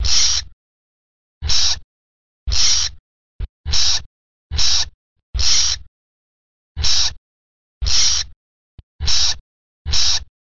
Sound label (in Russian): krota